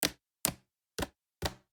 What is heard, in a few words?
frisbee catch